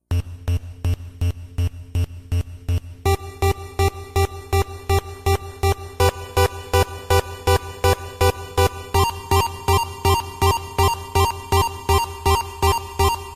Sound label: Magical Spell Music; timothy and the mysterious forest ps4 switch windows gamerip 2019